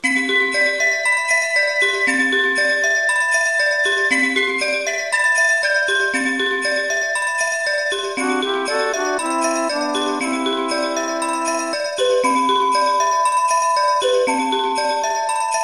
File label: Quiet Forest